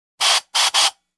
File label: Spray Gun Air Regulator